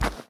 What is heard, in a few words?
grass; anna